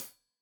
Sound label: Hat Tight, Hi-Hat, Cymbals